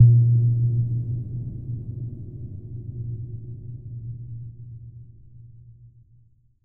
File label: editor blips; Source Engine; Portal 2